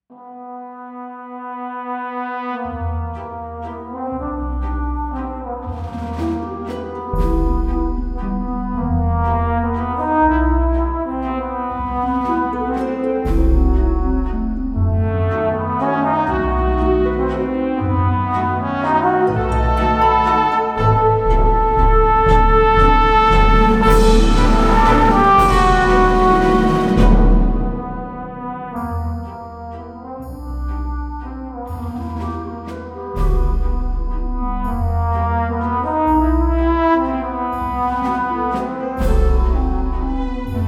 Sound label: Dark Waltz